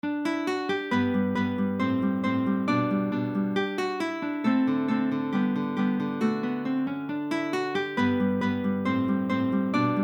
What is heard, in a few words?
Rondo In G Guitar Uke Duet; Ferdinando Carulli; Opus 241 No 34